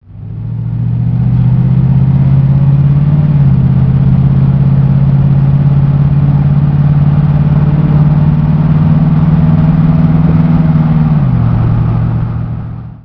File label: jensen drive